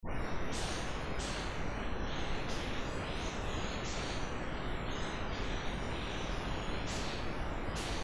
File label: no pc preamp gain denoiser